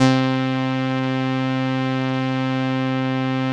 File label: 505, String Machines